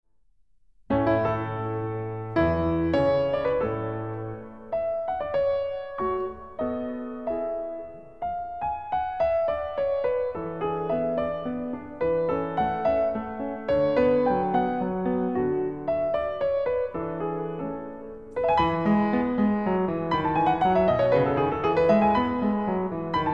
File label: sonate en la menuetto trio, piano; mozart